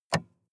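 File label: sports car interior switch off; verkehr transport; autos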